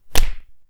Hard Punch

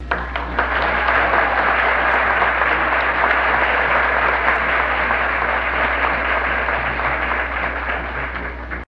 Applause

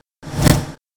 Fade In Fade Out

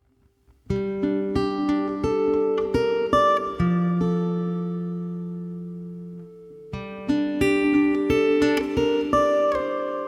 Acoustic Guitar Melody Fingerstyle

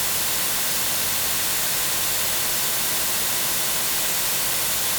Broad Spectrum Sound Experiment

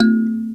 Indonesian Thum Note

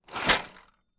Close

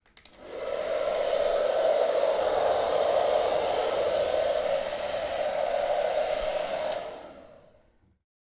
Hairdryer No Effect

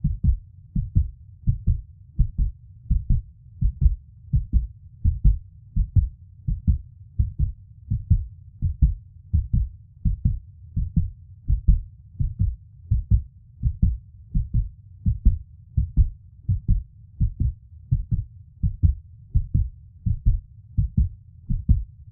Heart Beat Pounding Blood Flows In The Veins

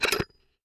Zippo

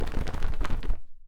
Sparrow Foley

